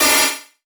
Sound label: Multimedia Internet CD-Rom Flash Ding